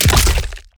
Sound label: lantern soul